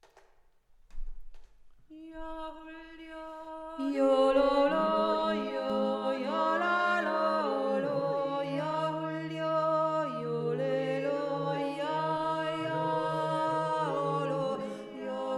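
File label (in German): Zwischenteil zweite Stimme, Jodler; Jo lo lo jo jo lo lo ho lo / Jo hul djo jo le lo jo jo ho lo l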